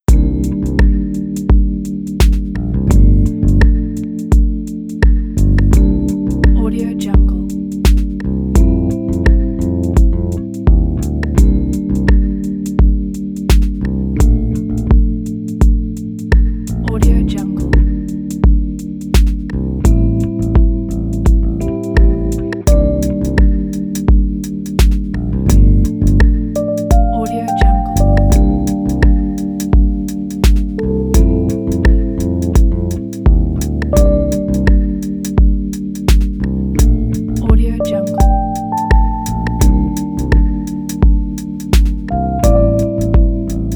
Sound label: sensual